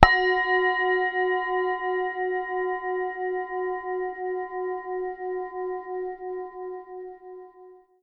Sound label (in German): Klangschale; Jupiter; Eros; Liefeld